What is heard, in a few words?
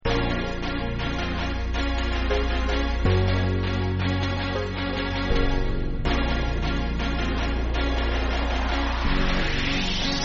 Reggaeton